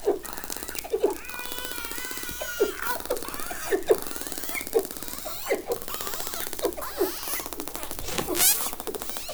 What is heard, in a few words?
T truncatus buzzes